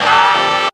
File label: bent notes